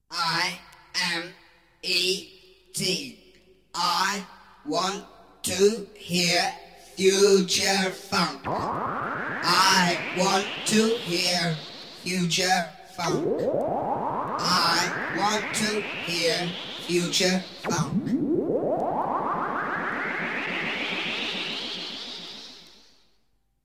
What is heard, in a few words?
Jingle